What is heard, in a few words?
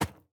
sand, walking; factorio 2.0.55